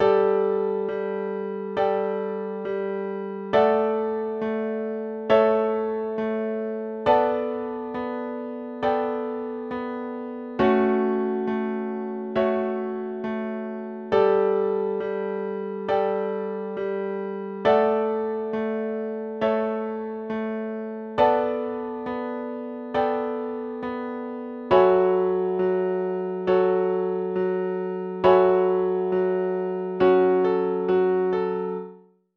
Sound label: instrumental